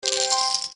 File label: collect; Black Jack